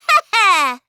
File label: hehe; miu